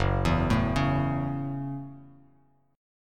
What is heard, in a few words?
F sharp Minor 7th F sharp, Bass Standard; arpeggiated; JGuitar